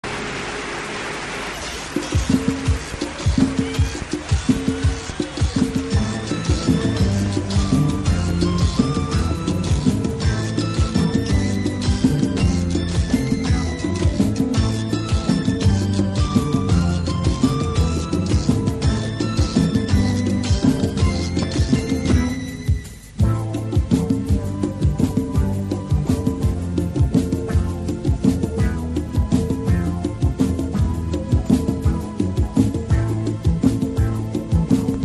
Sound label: Instrumental